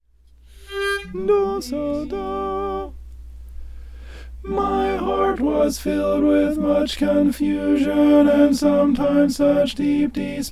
alto

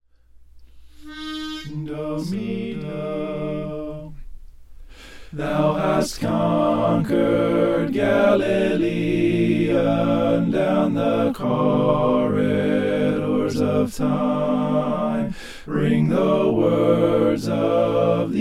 thou hast conquered slow mixed

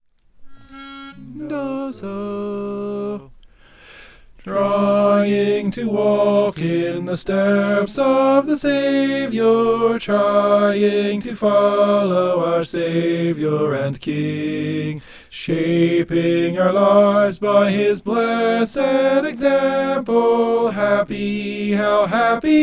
soprano

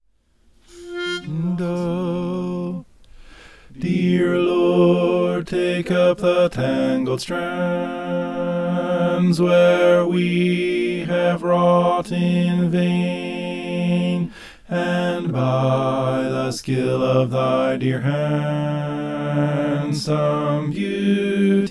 bass

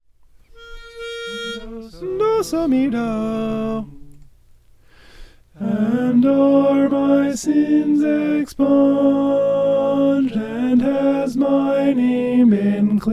and are my sins alto

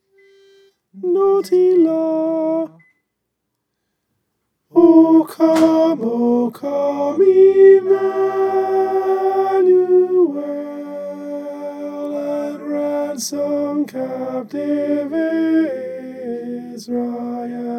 alto

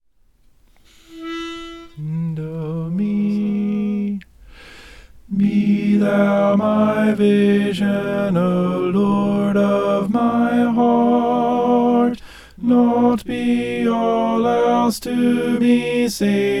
be thou my vision tenor

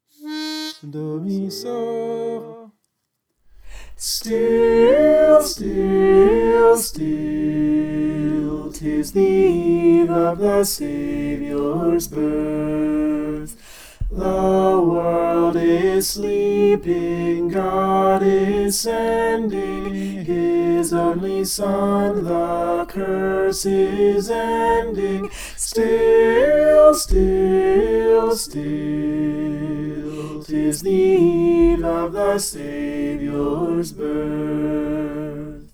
soprano

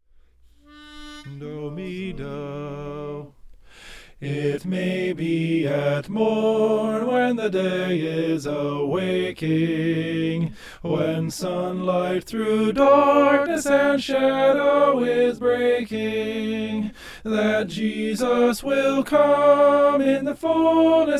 soprano; it may be at morn

